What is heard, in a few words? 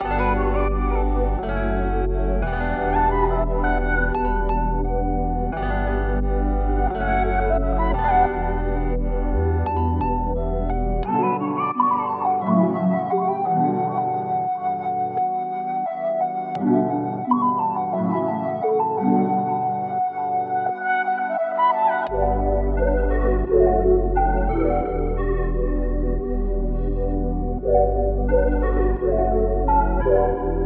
rnb